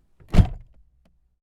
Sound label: car door close internal; spoteffects